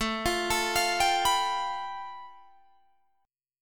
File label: A 7th Suspended 2nd Sharp 5th A, Guitar Drop D; 19,20,19,22,20,19